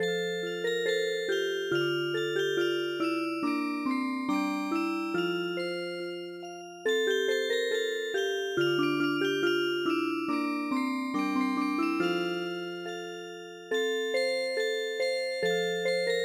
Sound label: Jig Music Box; midi; traditional